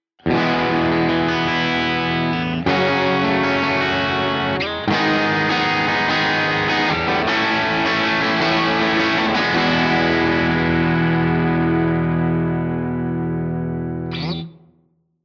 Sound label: warhorn